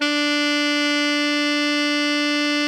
ALTO FF D 3, Sax

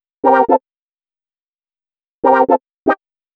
120 synth licks C, Keys, Nu Jazz House; PowerFX

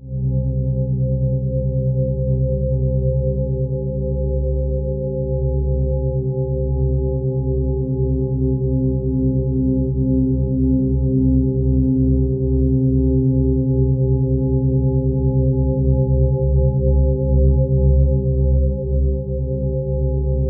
abyss, ominous drones; USB Soundscan vol.48; AKAI

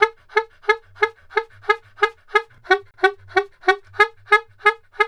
SAX, Balkanish Traditions; USB Soundscan vol.63; AKAI

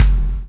CHAIN; Spectrasonics - Backbeat

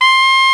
SOP HRD C, SOPRNO SX

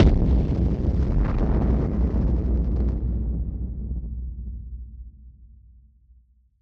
Hit Splosion; musicradar; bomb factory

